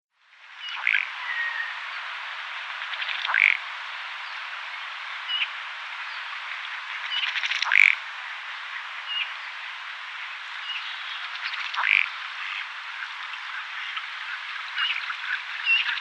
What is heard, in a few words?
Ruddy Duck male